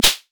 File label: Shaker Pairs; Shakers